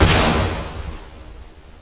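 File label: weapon; PC Gamer IT CD 15; bgw demo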